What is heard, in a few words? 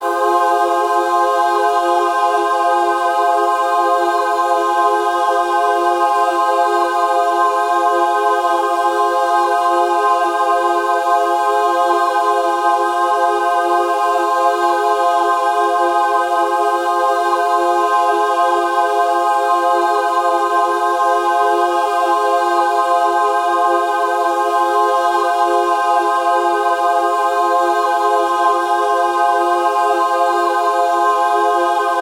Angelic Choir Ahhhh Fm Chord 90bpm